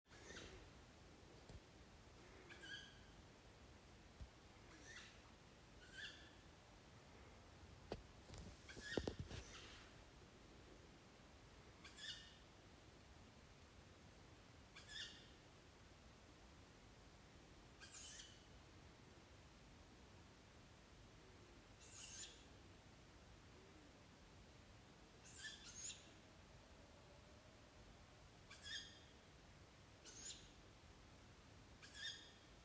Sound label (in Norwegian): kattugle